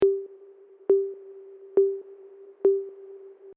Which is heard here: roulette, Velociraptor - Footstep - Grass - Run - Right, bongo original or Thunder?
bongo original